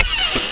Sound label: car door open